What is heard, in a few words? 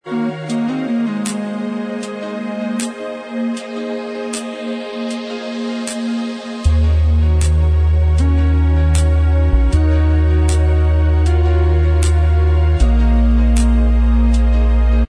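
instrumental, backing tracks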